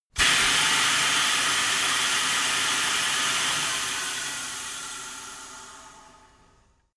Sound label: tire changing machine hydraulic hard in